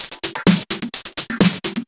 House loops 128BPM; Vengeance Samples

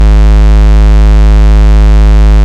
Bass, Root G#; Vengeance Samples

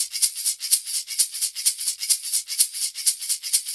Shaker Loop, 128 BPM; Vengeance Samples

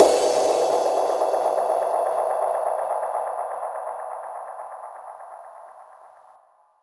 FX Downlifter

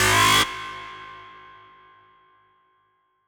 Tonal FX G#

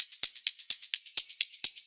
Electro Loop 128BPM; Vengeance Samples

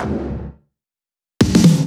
128BPM, House Electro Fills; Vengeance Samples

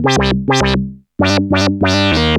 ARP Odyssey, Arp A Line, synth samples

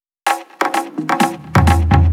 Fill 128 BPM; Vengeance Samples; VEH1 Loops

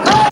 Ambiences, Places and Ambience

